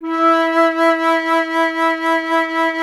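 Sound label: FLUTE FLUTE E 0; Roland LA; ORCHESTRA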